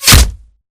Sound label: bullet light ballistic flesh; Titanfall 2